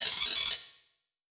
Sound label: Multimedia Internet CD-Rom Flash Hi-Tech